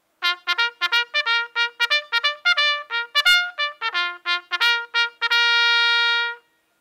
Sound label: Attend Wheels aka Assembly, bugle; c1880 Cycling Bugle; henry keat & sons cycling bugle